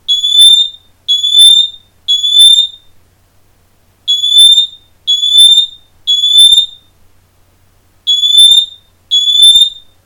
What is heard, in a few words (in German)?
elro cavius mini design rauchmelder alarm